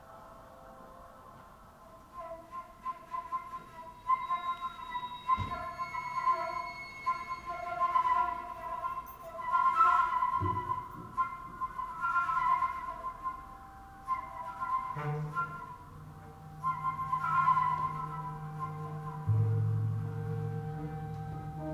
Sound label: T Flute